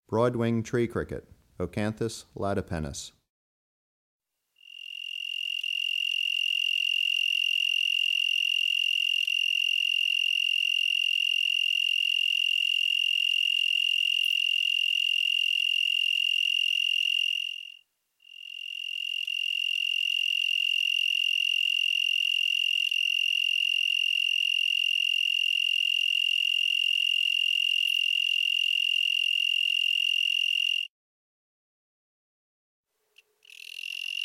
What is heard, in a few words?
Broadwinged Tree Cricket, Oecanthus latipennis; Crickets and Katydids